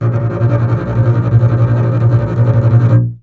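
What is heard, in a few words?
string contrabass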